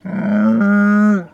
Camel Moo Moan; camels

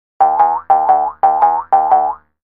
Boings Bouncing Boing, Bouncing Twang; boings / twangs / snaps

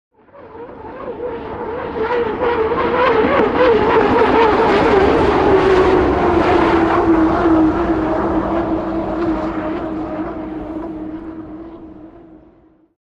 Hydroplane By Fast

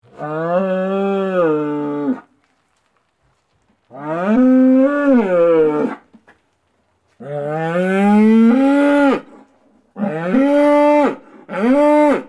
Cow Bellows; Various, Close Perspective; cattle

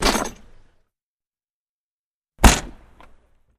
Carriage Door, carriages

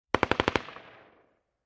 M16 Short Barrel Mach

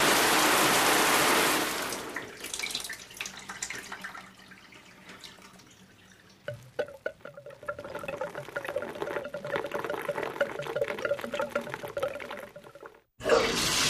Shower Run Turn Off

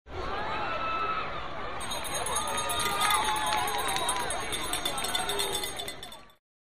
Crowd Reaction Noise, Medium Sized Distant Crowd

